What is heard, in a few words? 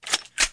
Gun reload; 30 Days to Survive